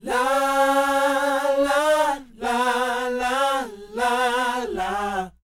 NA-NA F#; Spectrasonics Vocal Planet

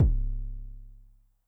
BD MOD Overdrive Low, bass drum, 909; classic machines; 909mod

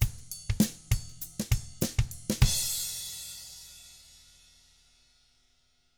FUNKY Drums

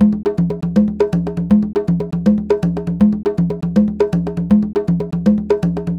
Conga, Latin Percussion; Sonic Foundry (Sony Creative Software); Joe Vitale Latin Percussion

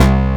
DIST BASS; SAMPLE WAVE MIXING 1

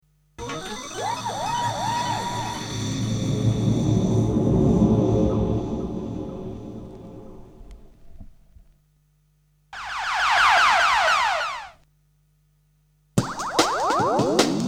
Sound Effects; Kniteforce; 1000 Samples Volume 1

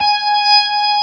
organ G#5, Organ B3 Hammond; AKAI S-1000

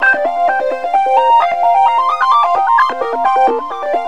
130 bpm

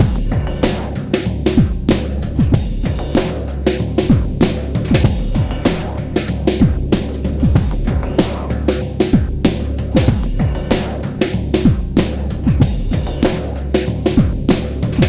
33 DOOM BOOM 95.2 bpm, Loops Breaks; POTBreaks

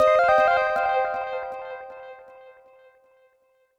Synth C, Synth Prophet One Shots; Electronisounds

